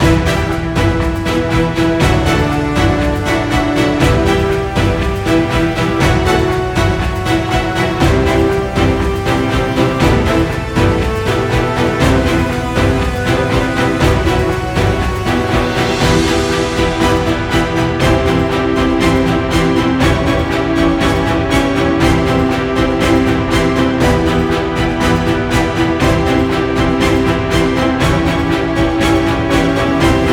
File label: tension; By Burak Sound Bank